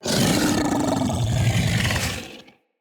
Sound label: Kubrow Feral Vocal Alerted, Enemies